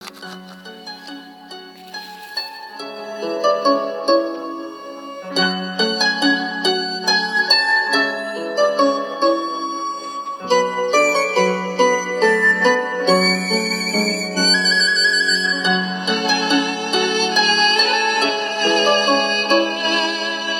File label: Sad Violin